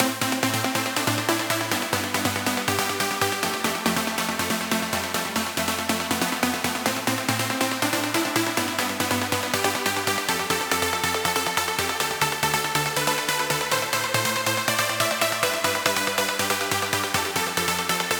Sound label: CHORDS